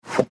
Go Kart Wheel Steering Turn Right Fast Electric; SPORTS; Go Cart Sound Effects; Blastwave

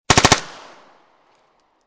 MP5 9mm 5 Burst Barrel, Machine Gun; Machine Guns; VRSonic

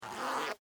Dress Pants Zipper, Up; Foley, Clothes; Dressing; Human; BlastWave

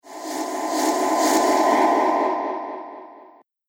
Ghostly Mover, horror sound effect; Ghost Sound Effects

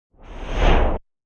Whoosh; Whooshing sound for video editing, movements, logos, flash, or animation; Sound Design

